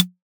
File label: door lock click; locksounds